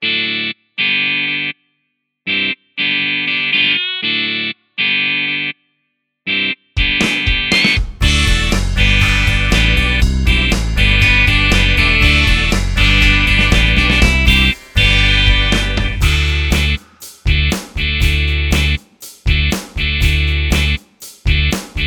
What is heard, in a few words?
Rock AMP